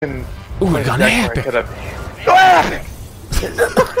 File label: epic